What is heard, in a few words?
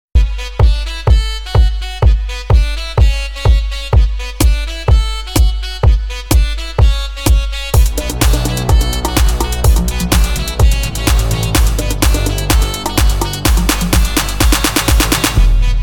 Intrumental